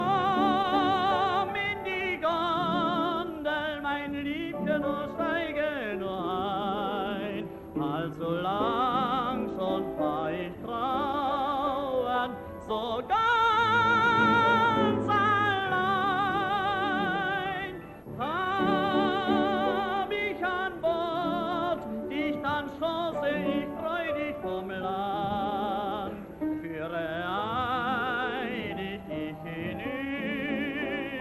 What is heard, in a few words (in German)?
Solo